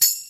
tamb, Tambourine; Idiophones; Struck Idiophones; Tambourine 4

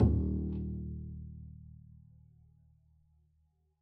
pizz eb1, double bass; dsmolken

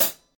Hat Closed Hard; hydrogen drum samples

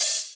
hat; cats

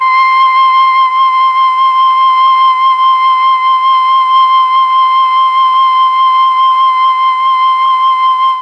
C4 mellotron flute

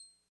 Clavisynth C6, TX81Z; Electrophones

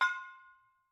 Brake Drum Yarn, Struck Idiophones

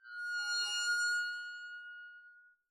arco noise harmonics clean, double bass; dsmolken; noises

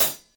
Hat Closed Med; hydrogen drum samples

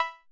808 Cowbell; hydrogen drum samples

